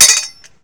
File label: LATCH, Thrash Metal; SFX